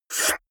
beatbox reverse suck in air damped

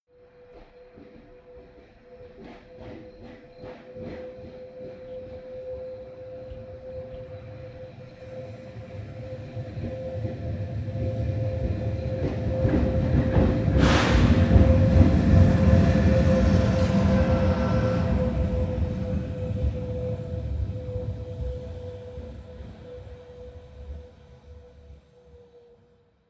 train locomotive drive by hum

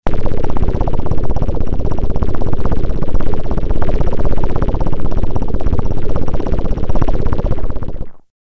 noise pulse

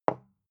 bottle put down

